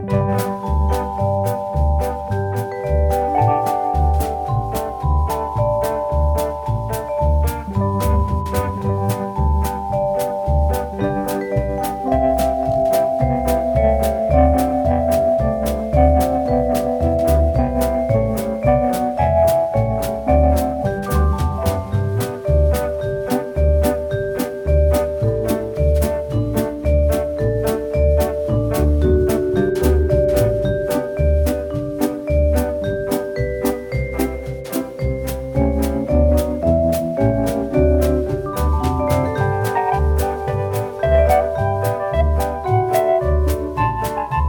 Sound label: Dixie loop